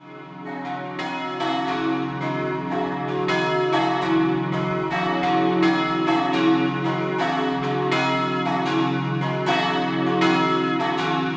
Clauzetto, campane

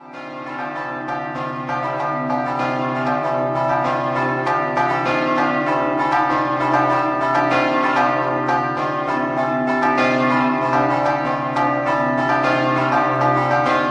Paderno, campane

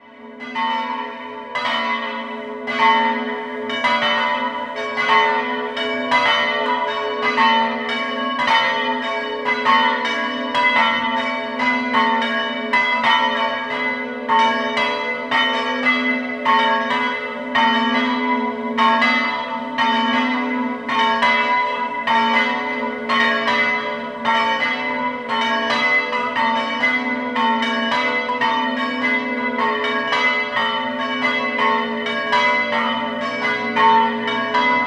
Nave, campane

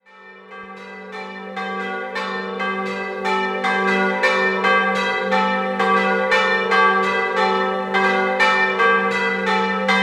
San Lorenzo di Arzene, campane

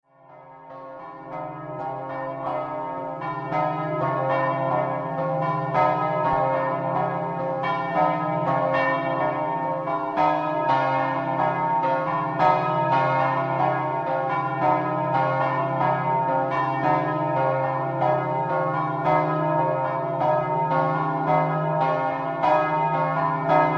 VERNASSINO di Sopra, campane

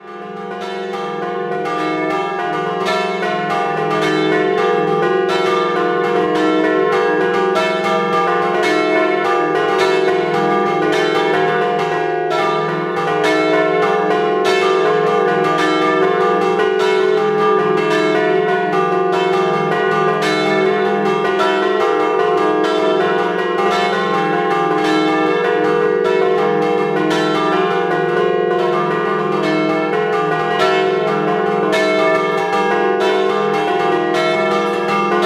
LAUZZANA, campane

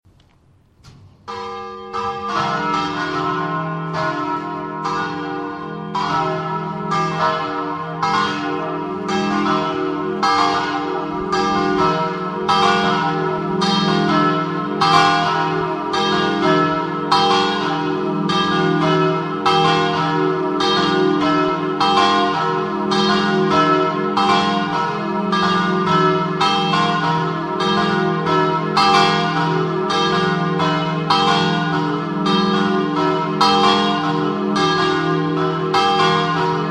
RESIUTTA, campane

